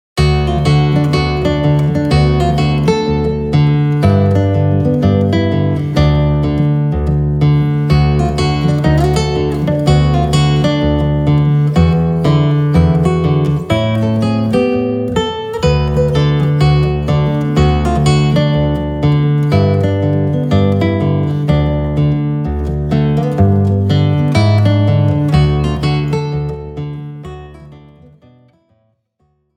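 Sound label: Fishing Blues, Guitar; Saitenwege nach Nordamerika Band 1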